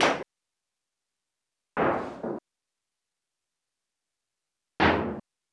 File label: ac duct rattle